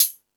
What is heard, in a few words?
High Hat, Drum Machines